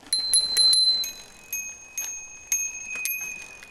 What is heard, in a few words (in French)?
klaxon velo; Le bourg